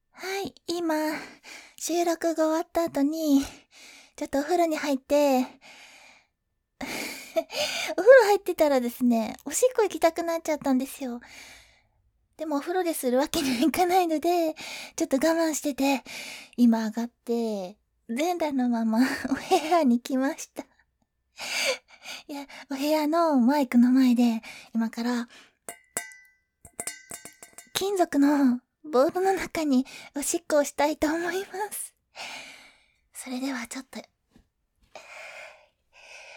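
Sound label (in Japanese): お部屋で！金属ボウルに！オシッコですッ！; 全裸で！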